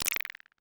ui order cancel

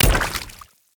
water gun

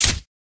circle sword

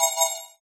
retreat warning

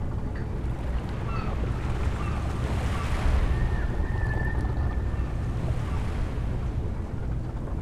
harbor busy

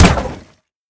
car crash

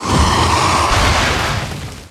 jump fire

